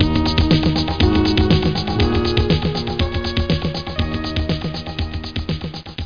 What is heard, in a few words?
level up